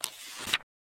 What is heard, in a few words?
start flip